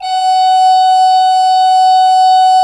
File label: HGRP F4, Cathedral Organ, HOLZGEDACKT; Propeller Island